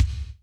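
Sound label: GATE KICK; Crypton - DrumBase5000; GATE KITS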